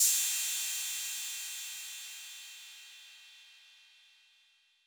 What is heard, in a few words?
Cymbal 808 Decay, Clean Digital